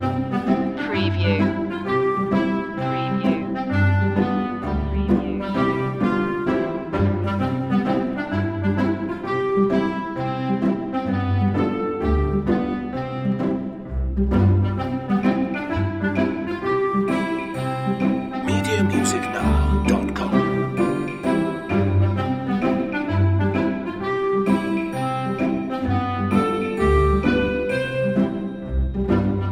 playground of happiness loop

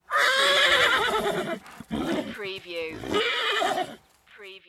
Horse neigh

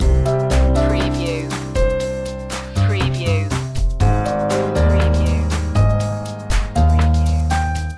country road loop

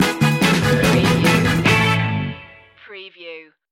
give me one time stinger

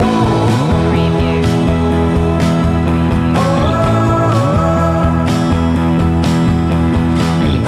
rock with us loop; Rock / Folk-Rock

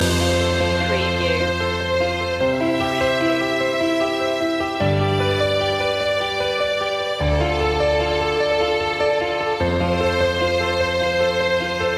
motivational notes loop end; media music now

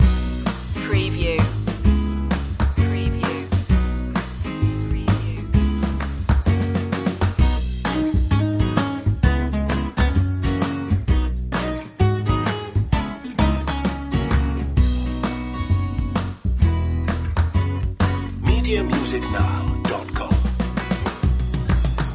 i feel alright loop

